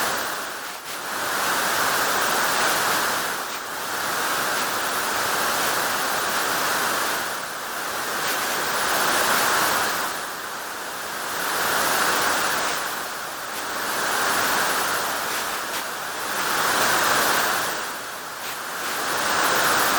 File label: ambience crowd walla